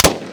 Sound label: machine gun m16 double shot close perspective; Weapons